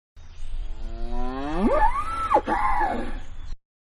Cow Mooing Soft; Cows; Animals; Cow-Moo-Soft; Manhattan Production Music

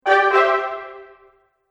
Fanfare; Musical

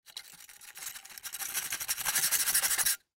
Wire Brush Scrape Wood Multiple Shake Fast; Hand Tool; Industry; Hand Tools; Blastwave

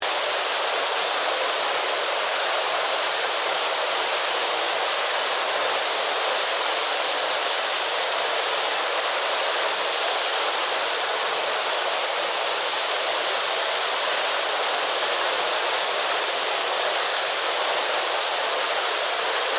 Insect Infestation, Bugs, Wings, Fly; Animal; Miscellaneous Insect; BlastWave

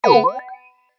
Multimedia Digital Bleeps; Beep Sound Effects

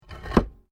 PAVING STONE MOVE, dragging

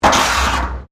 METAL BIN SCRAPE CONCRETE; Metal (Sound Effects:Materials)

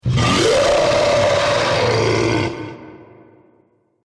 Scream Huge Monster, Monster Sound Effects; horror

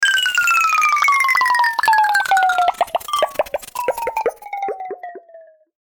Descend Xylophone Bubbles; Descending Sound Effects; Blastwave

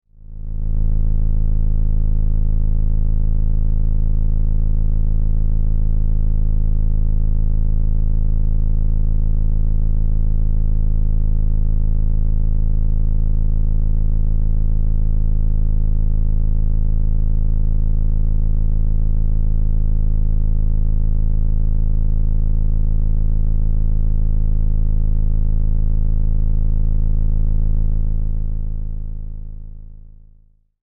Science Fiction Low Buzz Steady Synthesized Tone; Deep Tone Sound Effects; SFX Bible 6

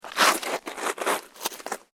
SNOW SCRAPE

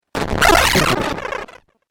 Crazy Glitch 8Bit Style; Video Game Sound Effects

